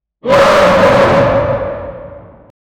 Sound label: a leviathan roar similar; whale